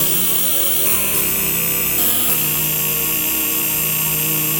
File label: Lead Rave, Drum N Bass; Keys; Pads; Sonic Foundry (Sony Creative Software); Knutrix Drum & Bass